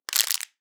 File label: Plastic Crackle